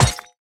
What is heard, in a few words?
car glass impact; factorio 2.0.55